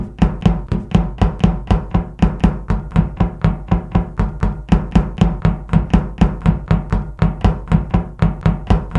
walking; among us

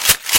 shotgun pump reload

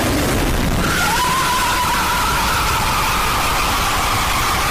screaming reeeeeeeeeeeee; ichigo; bankai

